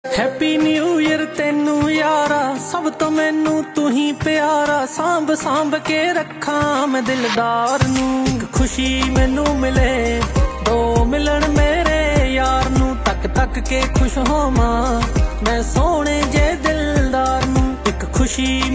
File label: Happy New Year, ringtone